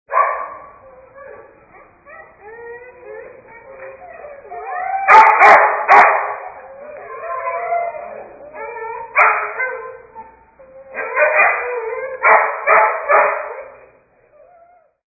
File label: Barking Dogs